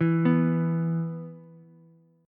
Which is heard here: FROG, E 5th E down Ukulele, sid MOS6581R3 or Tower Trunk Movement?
E 5th E down Ukulele